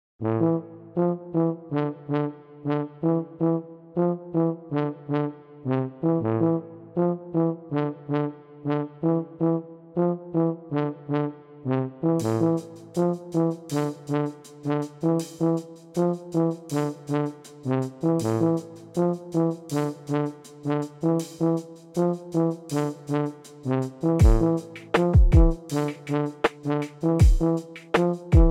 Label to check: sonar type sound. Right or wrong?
Wrong